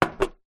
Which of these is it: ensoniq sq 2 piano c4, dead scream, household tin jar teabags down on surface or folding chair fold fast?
household tin jar teabags down on surface